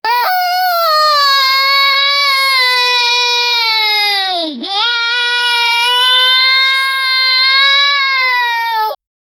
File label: crime child